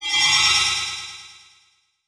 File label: Multimedia Internet CD-Rom Flash Electronic